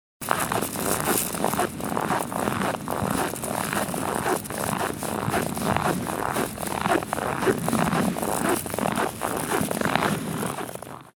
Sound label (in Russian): zvuk snega